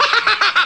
hahahaha; ST-XX Amiga Soundtracker Sample Packs

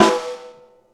Ring Snare, Snare One-Shots; KJ Sawka; Drum n Bass & Beyond; Drum Tool Box

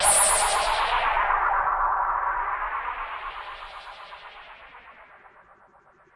BassStation

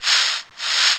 CABSA, DRUMS; Analogon

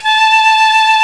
fife, British fife; Roland L-CDX-03 Disk 1

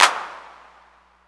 THE 808, ROLAND, DRUMS + PERC; Rubber Chicken Software Sample Bank

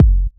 Kick, Kickdrum; Equipped Music; Loungin House

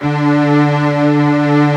STRINGS

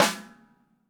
DW Green Maple Timbali Snare; Sonic Foundry (Sony Creative Software); Vital Drums

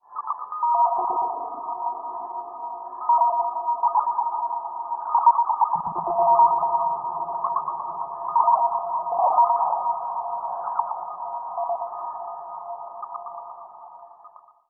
floating bloops, spacey; Zero-G Ambiosis

